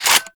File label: Heavy Shotgun Reload Start; Warframe; Tenno